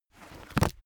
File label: heavy book shut